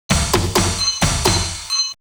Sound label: Beat; AMG